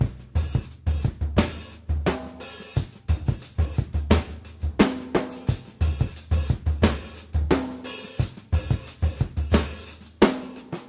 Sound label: DRUM LOOPS and BREAKS, 088 bpm